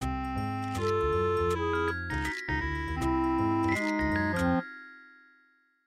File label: MIDI Animation stings